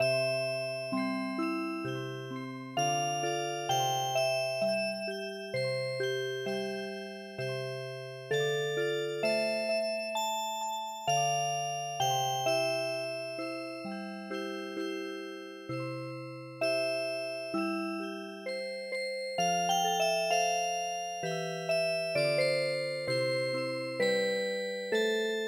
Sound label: Music Box, traditional